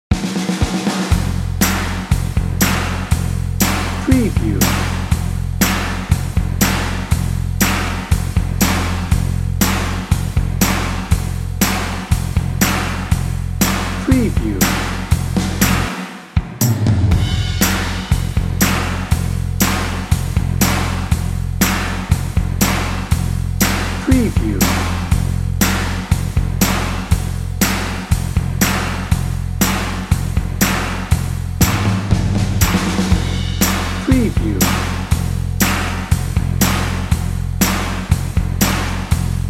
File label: detroit soul dnb